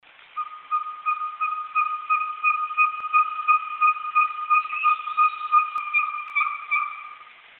glaucidium brasilianum

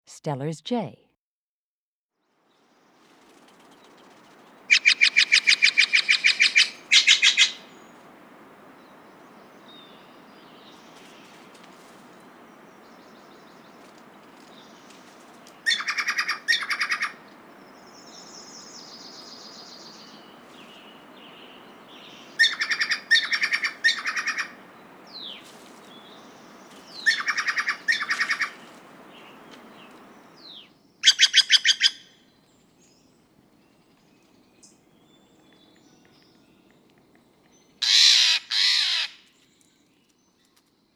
stellers jay

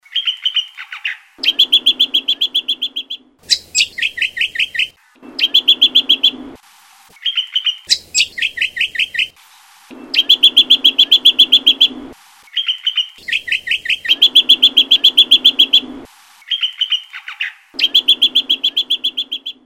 lichmera indistincta callsong

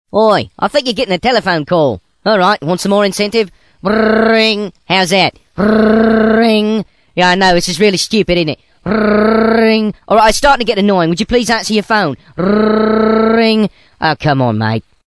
a phone call mate

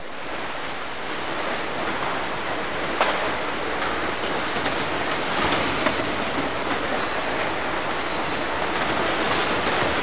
recreation

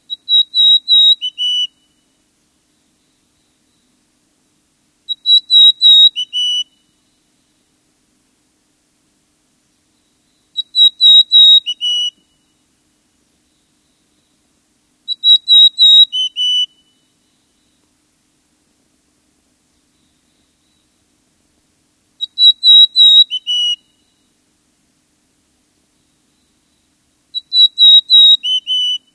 mountain chickadee

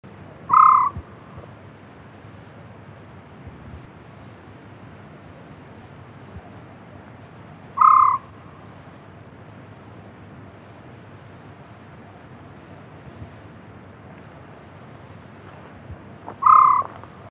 otus senegalensis

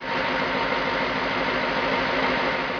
nautical

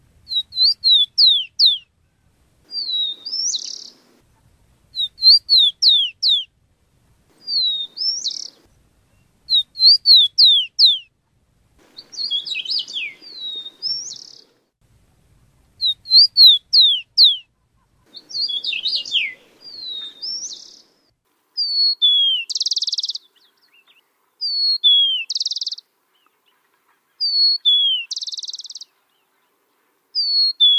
ticotico

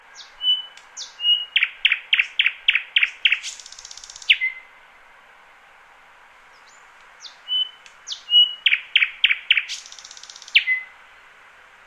thrush nightingale

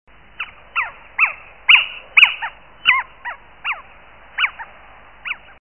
athene noctua

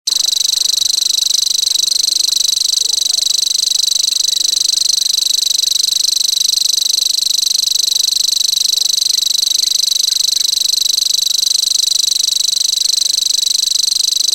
grasshopper warbler

